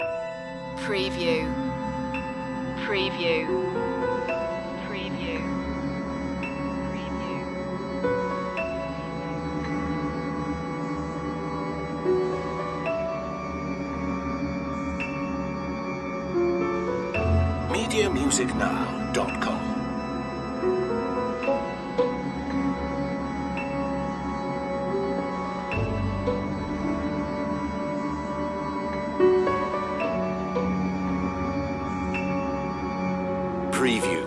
tension loop

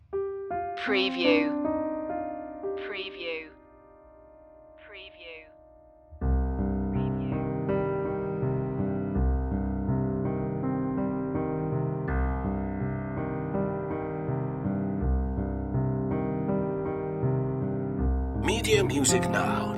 nostalgica no lead melody

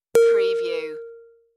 Dong

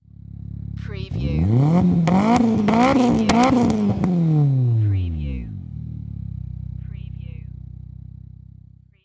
MITSUBISHI ECLIPSE EXHAUST MULTIPLE REVS

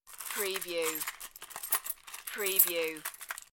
Coin Rattle

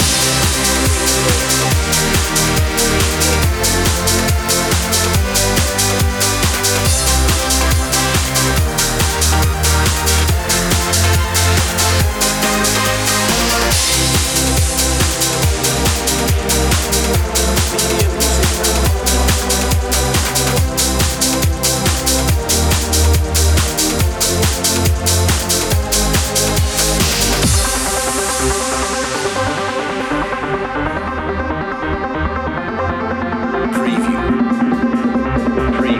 house loop

